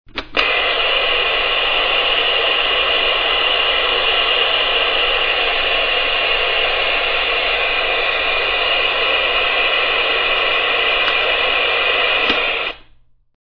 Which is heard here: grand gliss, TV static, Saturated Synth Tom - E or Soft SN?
TV static